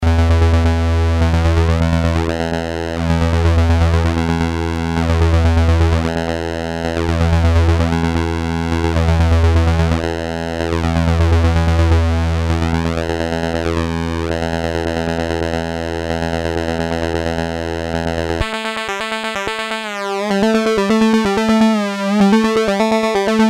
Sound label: pwm, novation super bass station; test: pulse width